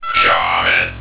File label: blip shaman